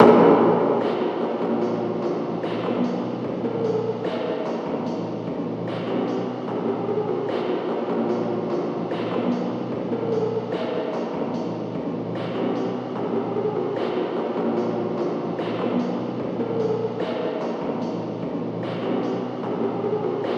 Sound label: gameover; Mid-Simulator